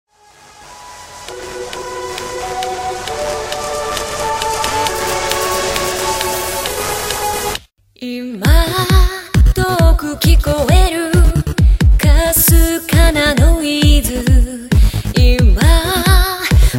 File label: Pops